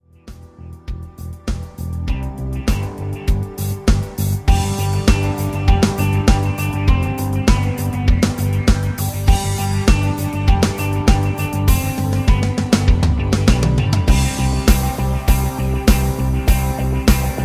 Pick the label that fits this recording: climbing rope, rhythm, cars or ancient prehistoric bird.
rhythm